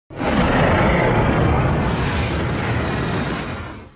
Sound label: Godzilla Aquatilis Roar